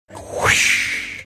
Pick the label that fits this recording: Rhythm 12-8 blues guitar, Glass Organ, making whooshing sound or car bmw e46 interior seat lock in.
making whooshing sound